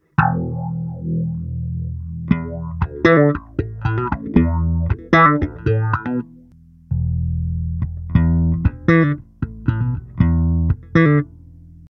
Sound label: phaser